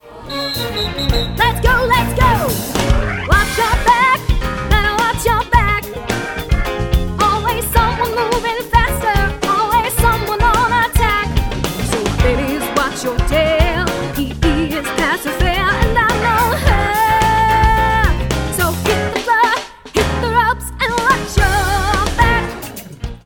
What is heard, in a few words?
Vocals